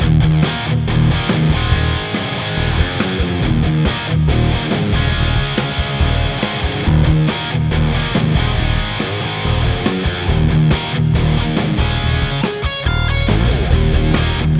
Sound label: Strat; Gear Demos